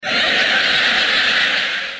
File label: laugh